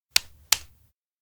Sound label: hand clap; fumiya; anemoi パッケージ版 1.1